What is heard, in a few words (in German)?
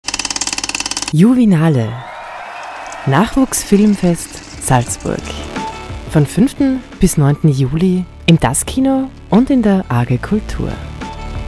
Filmfest Jingle kurz; Juvinale